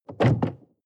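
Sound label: sports car interior trunk open hard; verkehr transport; autos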